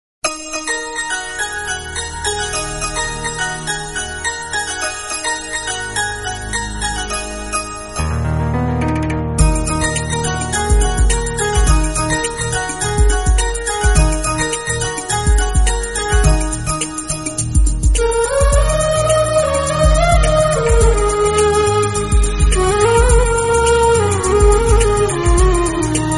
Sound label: bgm